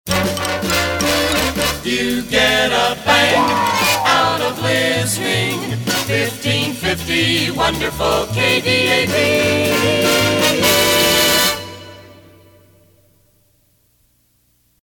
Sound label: Contest; KDAB